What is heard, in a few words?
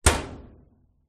household clothes dryer top loader lid down